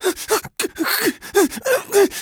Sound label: panic; mgsv